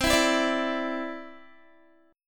C Major Add 9th, Guitar; Cadd9 strummed

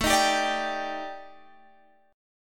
Eb Major 7th Flat 5th A, guitar

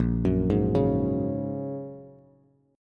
Bb Minor 7th Sharp 5th, Bass; Bbm7#5 arpeggiated

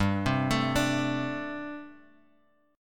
G Suspended 2nd Suspended 4th, Bass Standard

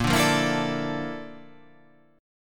A sharp Major Sharp 11th

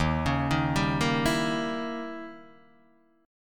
Eb 7th Flat 9th Eb, Guitar Open D

